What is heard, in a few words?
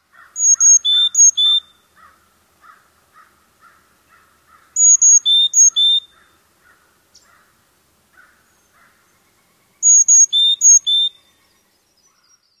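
Carolina Chickadee five-note song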